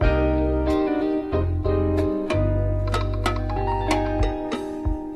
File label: keys, phonky loops